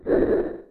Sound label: Ambience; Super Smash Bros